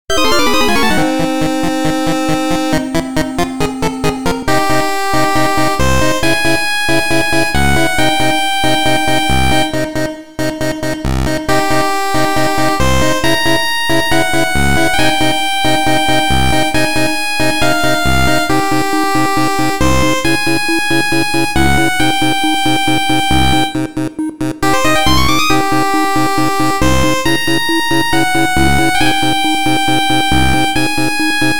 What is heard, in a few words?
8bit; sentou; surround